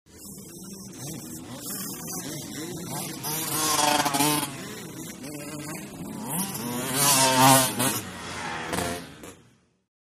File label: Motorcycle Motocross; By 125 Cc ( Several ), Cu Fast Bys R / L, Shot Cu.